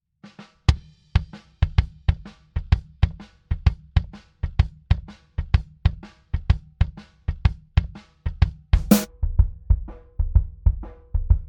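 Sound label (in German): bass drum solo, Bassdrum - innen, aussen, zusammen; C414B-ULS (Niere) in knapp 10cm Entfernung zum Fell; erst das RE27 innen, dann das 414 aussen und dann beide zusammen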